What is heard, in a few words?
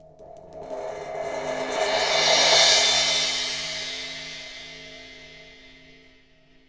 CYM ROLL, AMB SOUNDS